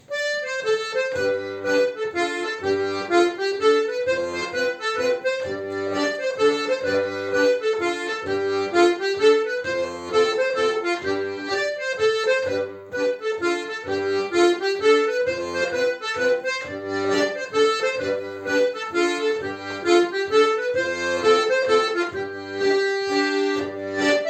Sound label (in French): Mazurka limousine; Répertoire collectif 21 22